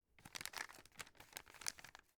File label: paper handling